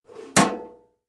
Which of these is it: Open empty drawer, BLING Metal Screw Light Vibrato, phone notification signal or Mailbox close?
Mailbox close